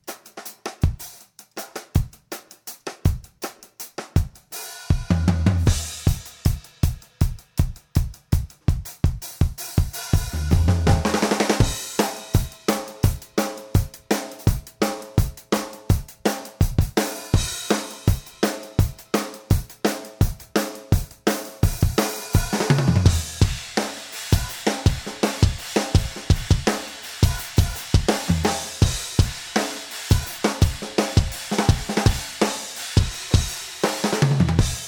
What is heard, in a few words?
Drum Buss bypassed; museq